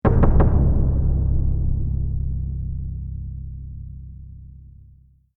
Drum Hit Trailer Musical Forbidding Triple Hit Trailer Accent; Forbidding Drum Hit Musical Triple Impact Trailer Accent Castle Door Knock Haunted Eerie; Trailers; Sound Design